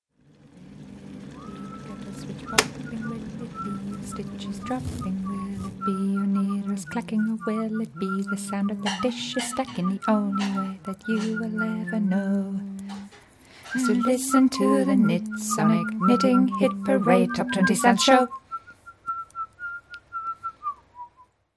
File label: acoustic version of knitting hit parade show jingle; knitsonik; top twenty sounds